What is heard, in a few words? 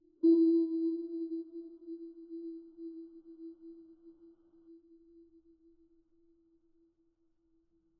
beep, Smoke Detector